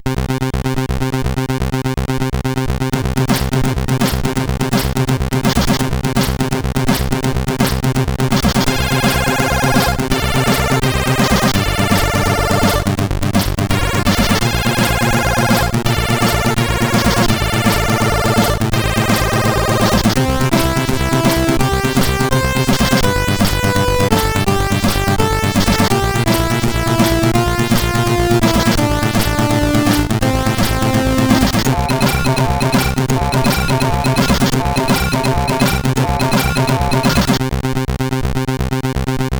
Star Power III, CSG8580

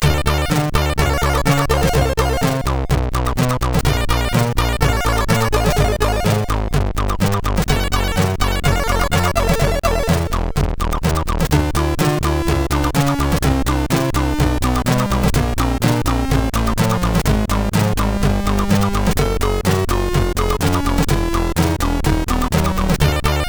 MOS 6581R3

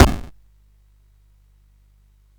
SFX; Narco Police

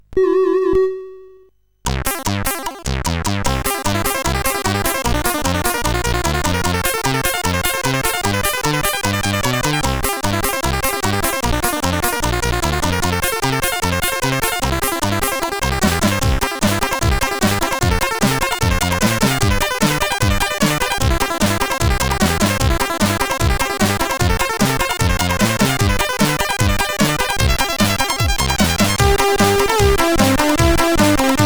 Country, sid CSG8580R5; hvsc

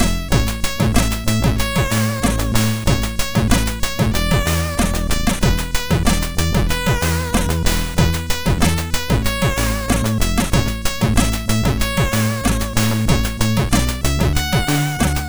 rap, sid MOS6581R4

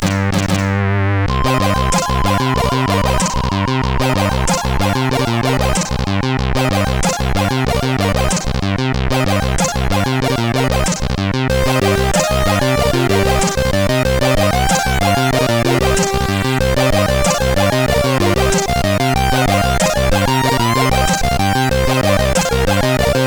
Leech, CSG8580R5; hvsc

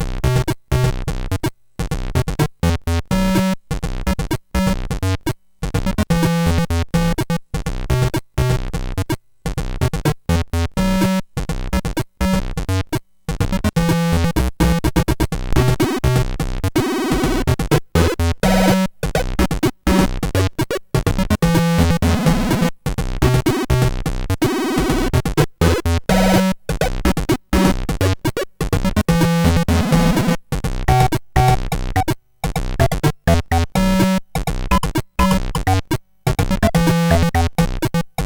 sid MOS6581R3

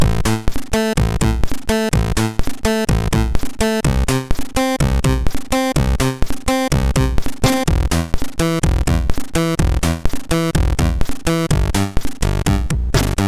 Castle Intro MOS6581R2

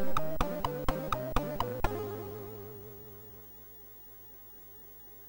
sid, MOS6581R3; Atic Atac C64

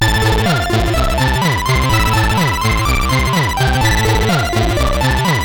X-Music Remix, CSG8580

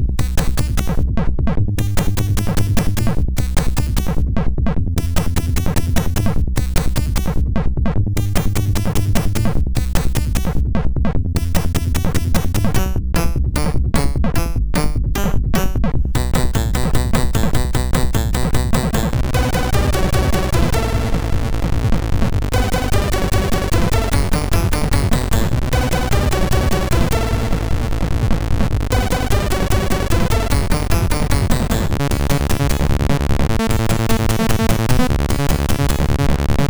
Rain sid MOS6581

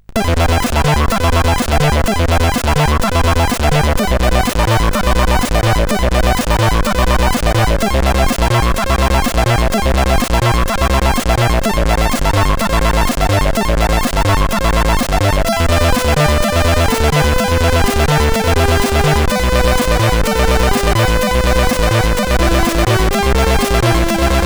Introtune, CSG8580R5

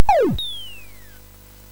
SFX, MOS6581; Narco Police; MOS6581R4; hvsc

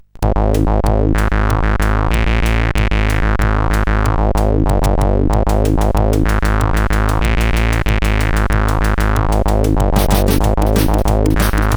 Hard Tekno, CSG8580R5

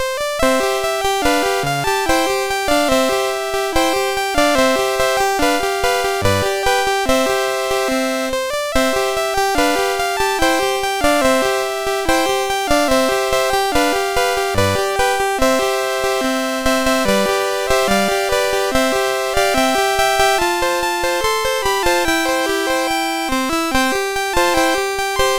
Muss I Denn, MOS6581R4

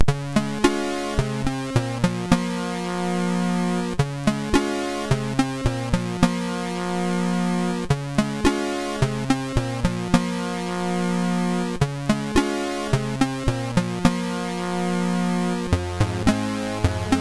sid MOS6581R4

